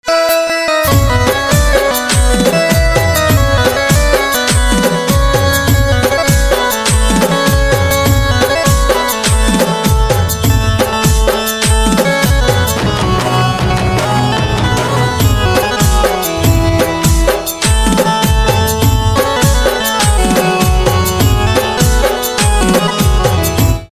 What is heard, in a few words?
Banjo Instrumental Ringtone, devotional instrumental ringtone